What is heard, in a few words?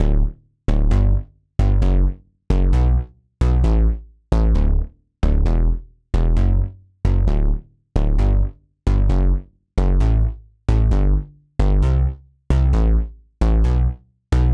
Poly Bass; super crush k.o.